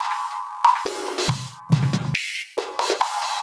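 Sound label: Saver Loop, beats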